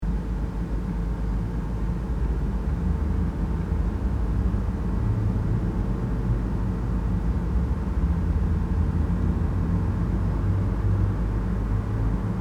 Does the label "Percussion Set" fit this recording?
No